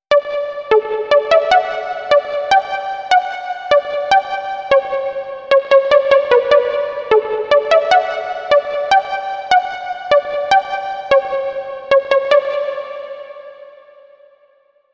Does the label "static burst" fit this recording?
No